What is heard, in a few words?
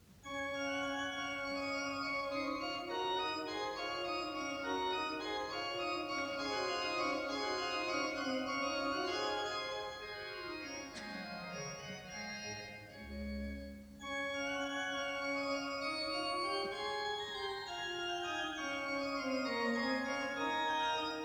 Sonata D-dur, orgel; orgelkonsert; Scarlatti